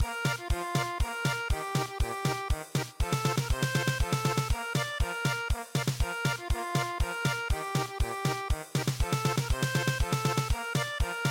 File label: German Polka